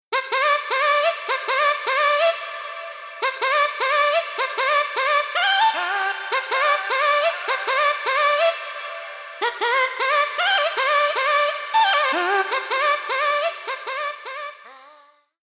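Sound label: vocal Reverb; Mix Insert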